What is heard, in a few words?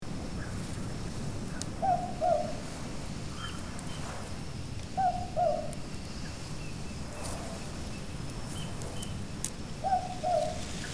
Haunted forest ambience exploration loop